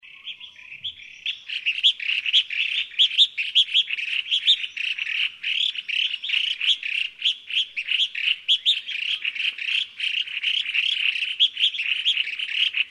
白環鸚嘴鵯; 領雀嘴鵯、綠鸚嘴鵯; 鵯科/留鳥

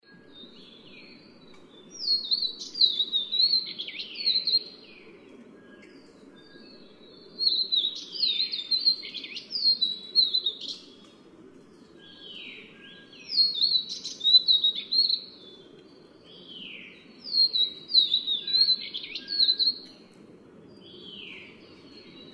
黃腹琉璃; 黃腹仙鶲、棕腹藍仙鶲; 鶲科/留鳥

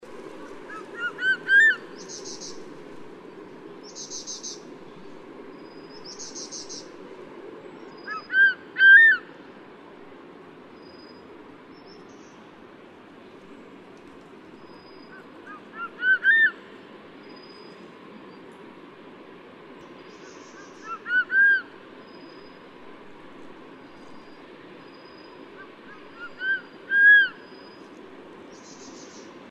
大冠鷲; 蛇鵰; 鷹科/留鳥

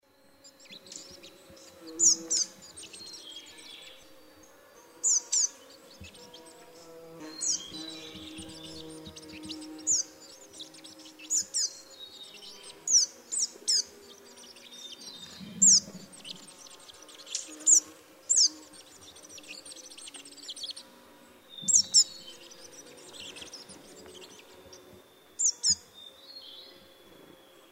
煤山雀; 山雀科/留鳥

